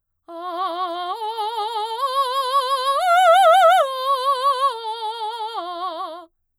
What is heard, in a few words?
arpeggios f slow forte a, vocalset